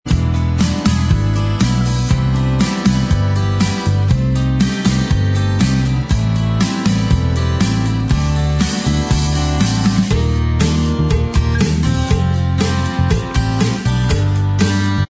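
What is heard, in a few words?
j pop, instrumental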